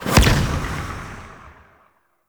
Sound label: Combat Operator Void Melee Punch; Warframe; Focus; Operators